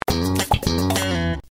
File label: sitcom transition bass riff; seinfeld